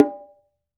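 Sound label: low bongo open; Cuba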